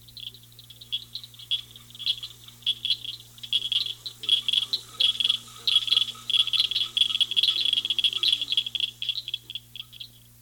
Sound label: southern cricket frog chorus